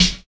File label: SD, Big Beat Drumloops; USB Soundscan vol.25; AKAI; MOVE 138